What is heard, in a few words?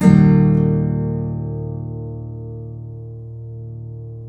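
nylon up major E; FLAM CHORDS; Guitars; Spectrasonics; Hans Zimmer Guitars VOL-2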